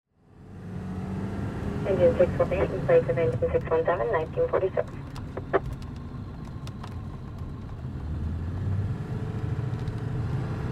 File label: ambulance inside